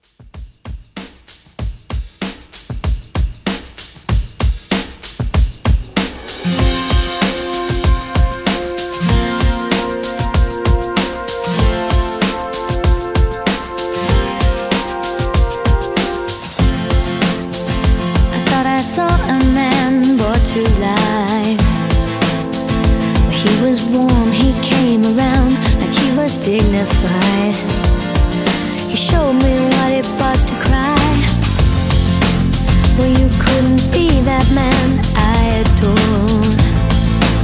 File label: 90s Pop Re-Drum 96 CLEAN